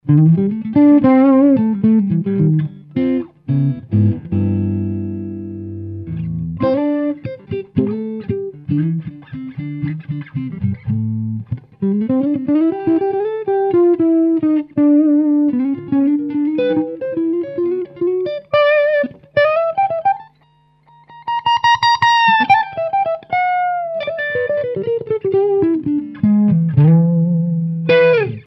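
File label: Pico Plexi Head Mixdown Clean Blues, guitar amp; miniature tube amp; It uses a 12AX7 in the preamp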